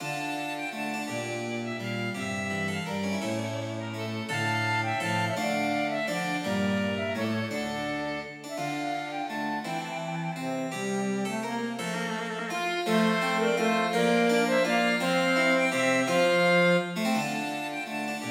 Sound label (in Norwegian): Gigue for fløyte, fiolin, cello og cembalo